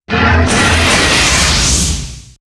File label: Dual Breath; Dota 2